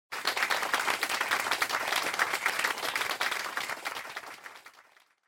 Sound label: Applause Mid